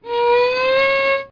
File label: violo; Microsoft Graphics Studio Greetings; Microsoft 1998